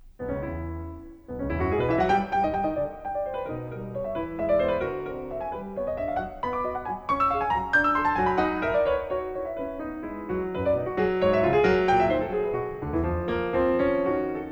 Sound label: Hindemith Sonate fur Klavier No2; Heidsieck